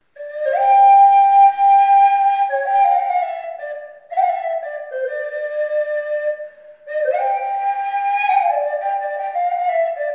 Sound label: song flute